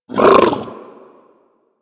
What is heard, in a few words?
Vocal Tranq Dart Grunt; Warframe; Hunting Critters; Legendary Kubrow